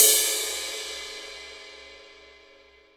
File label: Cymbals